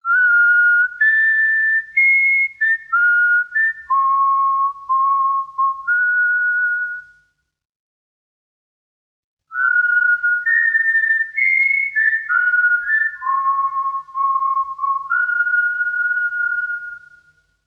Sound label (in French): appel scout